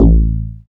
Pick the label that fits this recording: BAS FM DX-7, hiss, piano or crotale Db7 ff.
BAS FM DX-7